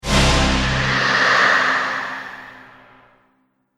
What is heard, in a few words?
Demonic Voice Impact, Monster Sound Effects